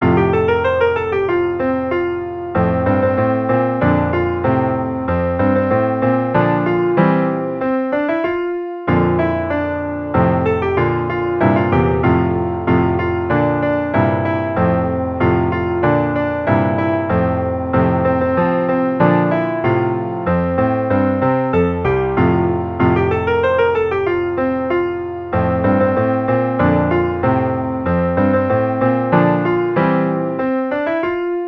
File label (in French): piano; On va vous parler de justice